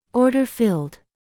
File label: order filled; QuantumTerminal